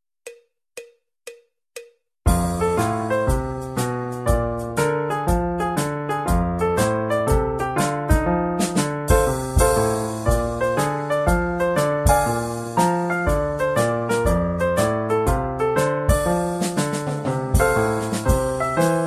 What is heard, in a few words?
drumming sessions